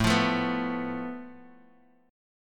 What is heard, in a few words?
A Suspended 2nd Sharp 5th, Guitar, Open D